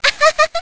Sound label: Girl Laugh; Zelda